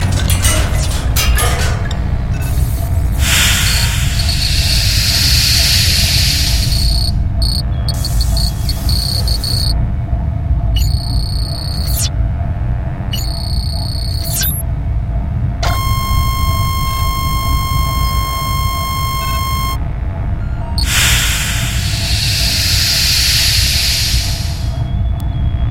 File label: pads; Muzik 4 Machines show 4 sept 2008